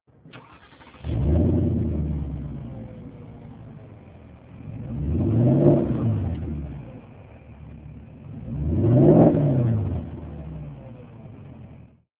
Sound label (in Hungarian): inditas gazfroccsek, porsche cayenne